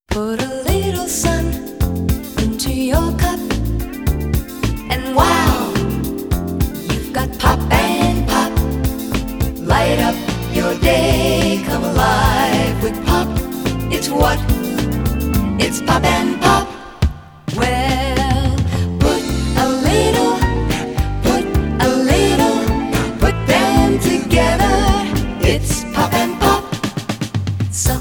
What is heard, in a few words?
Suntory Pop Jingle